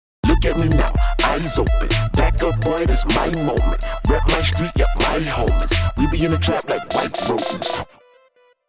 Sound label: Double On